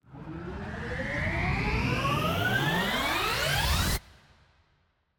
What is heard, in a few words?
8 Beats; Phoenix